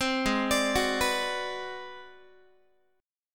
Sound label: G sharp 7th Sharp 9th Flat 5th G sharp down Guitar, chord; G#7#9b5; arpeggiated; JGuitar